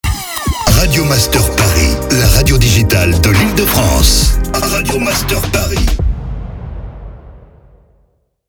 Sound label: Jingle MEDIUM; Paris; Radio Master PARIS